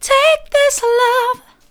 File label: take this love soft, vocal